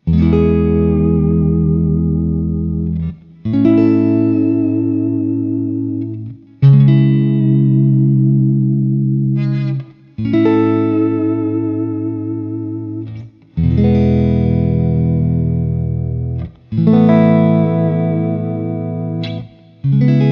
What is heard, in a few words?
strat neck, guitarras